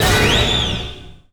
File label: Final Battle - Combo Stab; Bee Movie Game